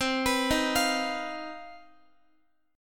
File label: C Minor Major 11th C down Guitar 4F 4A 5C 5G 5C 6E; CmM11 arpeggiated; JGuitar